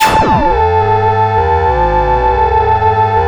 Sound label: GRINDER A6; Drums In Your FACE!